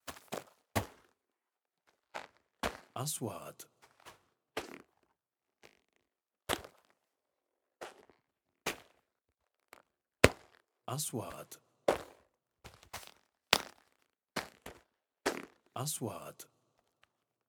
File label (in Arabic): خطوات على أرض صلبة; sound effect; رمال